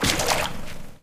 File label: paint blob splat